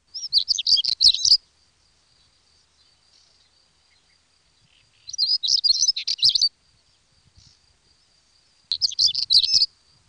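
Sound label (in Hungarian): Cigánycsuk; Madarak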